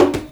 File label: CONGA; InVision Interactive; Percussion Slam; CAFFEINE